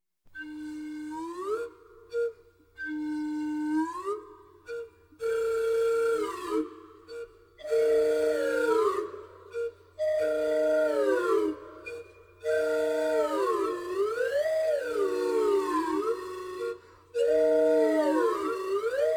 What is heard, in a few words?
Wet Whistles; 60x60; 2011 Canada Mix